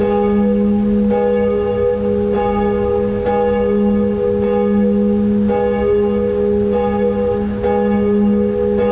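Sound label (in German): zellerfeld klein, Glocke mit Motor betrieben; Stahl; Marienkirche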